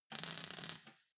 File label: weld sizzle short